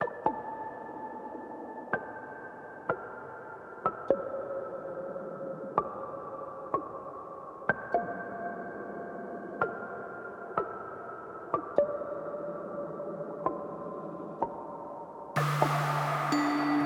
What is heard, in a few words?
Midwest Loop; Midwest Loop Start